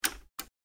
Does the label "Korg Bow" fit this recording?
No